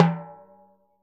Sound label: TIMBHI CTR L, Synclavier Percussion; ILIO; Synclavier Percussion 2; World & Orchestral